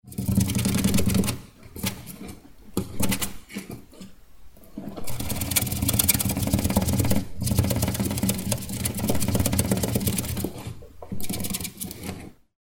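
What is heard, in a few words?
sounds of hamsters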